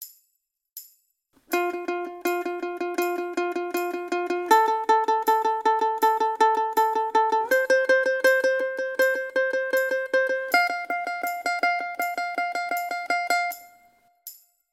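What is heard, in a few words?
tremolo picking, ukulele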